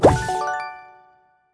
Goal Collect Fish; Ellen's Garden Restoration; android

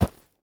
snow land

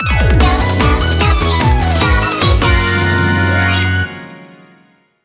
Menu; PriPara All Idol Perfect Stage

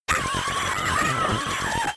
Shriek Attack; Knuckle Sandwich

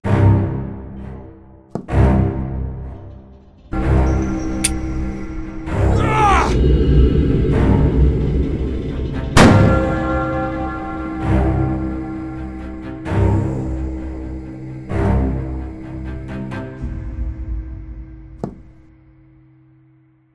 Middle Monster Wave; Fight the Horror; ps4 windows xbox one gamerip 2019; Middle Monster S4 Jarob

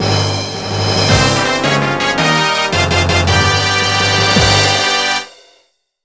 Jingle Trophy; yakuman ds ds gamerip 2005

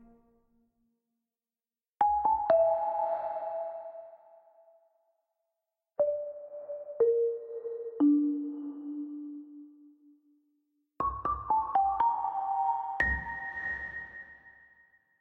Marimba; Toki Tori 2

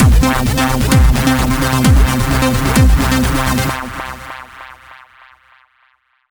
Action Boss; PunkGirl

